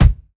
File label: natural kick one shot F sharp key; kick drum samples; one shots